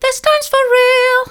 this times for real mid, vocal

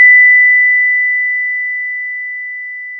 pop, Sound of a school bell

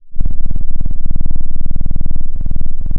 pop, Sound of a cat purring

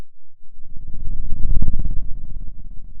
Sound of a dinosaur

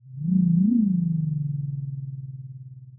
Sound of an owl

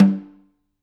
K2 room Snr Off

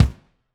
Kick, drum

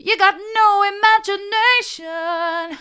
you've got no imagination mid, vocal

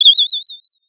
Sound of a bird tweeting nicely

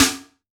Snr, drum

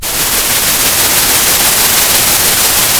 pop, Sound of a thunderstorm

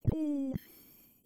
pleasure low; VIVAE; vocaltrax

